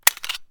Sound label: reload click; minecraft